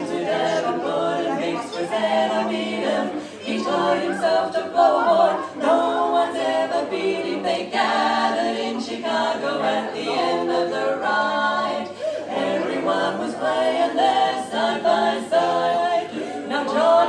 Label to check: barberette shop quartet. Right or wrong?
Right